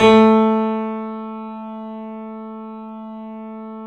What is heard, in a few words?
3D Boesendorfer, Pianos, A